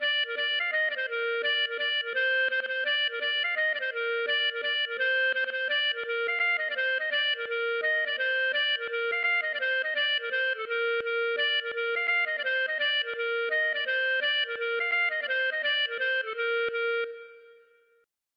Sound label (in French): gavotte; Gavotte pourlet No5